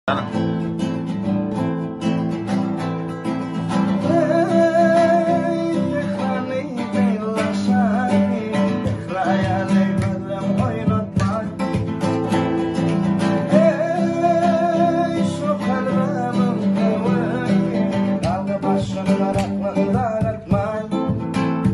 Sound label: turkmen gitara cover